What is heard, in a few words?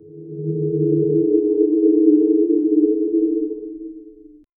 distopic misterious drone